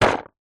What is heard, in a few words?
leisure dominos wooden in card box set down